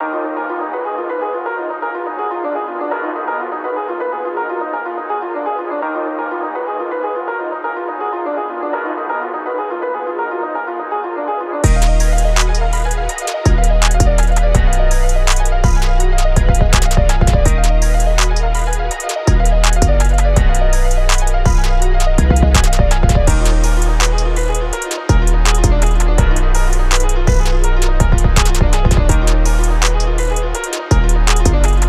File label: E♭m BPM165